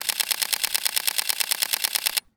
Retro Devices Digital Camera Shutter Burst Mode Sony a7 III; Soundkrampf